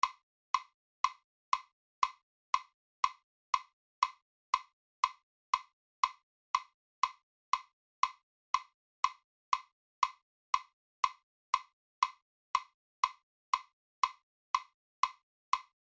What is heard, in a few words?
CLAP 4 Beat